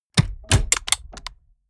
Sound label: mechanics medium rattle; industrie wirtschaft; mechanik